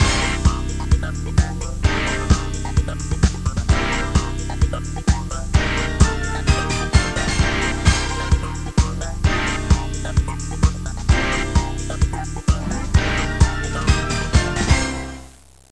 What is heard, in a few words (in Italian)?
musica newage